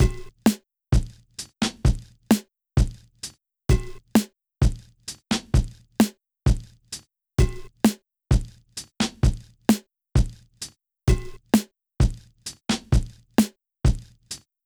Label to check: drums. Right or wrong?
Right